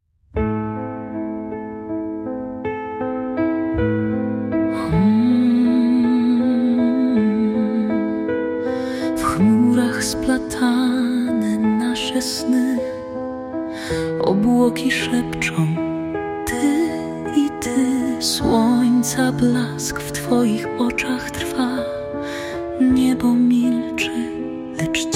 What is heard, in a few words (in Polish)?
disco polo